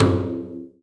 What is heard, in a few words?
Perc; Ghosthack - Abstract Percussions Volume 4; Argon